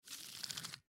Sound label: Keychain Mechanical Retractable Retract; Pull Out; Machinery